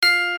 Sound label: win line; Gem Star